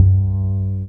Illicit Bass - 9B; Jungle; Blu Mar Ten; Jungle - 1989 to 1999

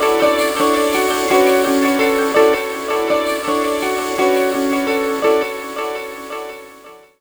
10A, Riffs, Arps & Hits, Jungle; Blu Mar Ten - Jungle Jungle - 1989 to 1999 Samplepack

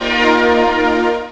Detroit Synth; Jungle; Blu Mar Ten - Jungle Jungle - 1989 to 1999 Samplepack

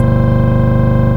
Mains Hum; Jungle; FX; Blu Mar Ten - Jungle Jungle - 1989 to 1999 Samplepack

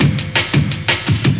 Zone Break; Jungle; Breaks; Blu Mar Ten - Jungle Jungle - 1989 to 1999 Samplepack

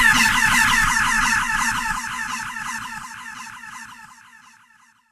Metal; Jungle; Blu Mar Ten - Jungle Jungle - 1989 to 1999 Samplepack

